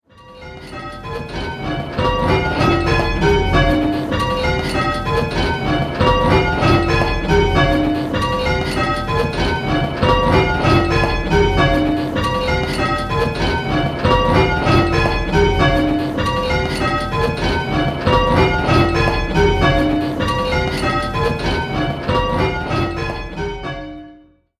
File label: 6-Bell; Who's leading; Which Bell is Leading?